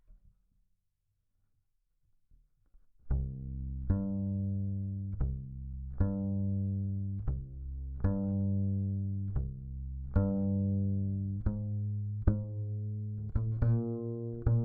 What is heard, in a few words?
Bass DI